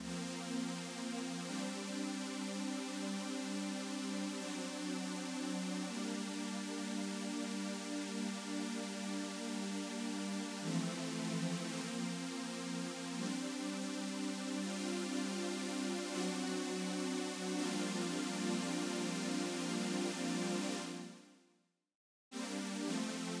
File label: pad 2B; Big Fish Audio; Legendary; Shes Mine